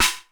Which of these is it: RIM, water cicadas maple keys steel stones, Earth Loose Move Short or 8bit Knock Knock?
RIM